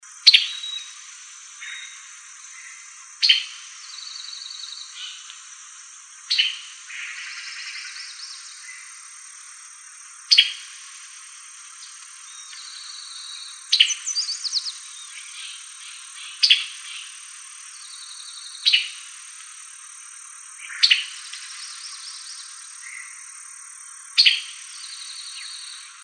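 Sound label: Summer Tanager calls, Tanagers; SUTA; deviche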